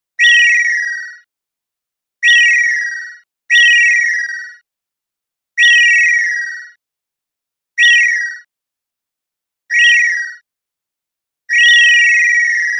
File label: Bursts Jangling Deli, beeps & tones